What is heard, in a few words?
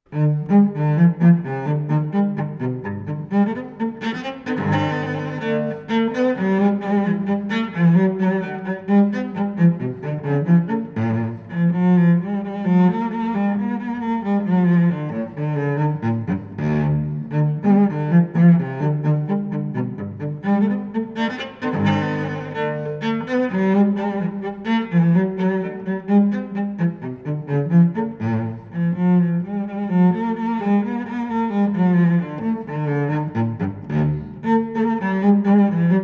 Solo Cello; G major; Gigue; Suite No.1; BWV 1007; J. S. Bach